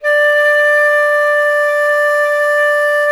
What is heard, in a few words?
flute D; Miroslav Vitous - Solo Instruments VOL-2; Solo lnst. II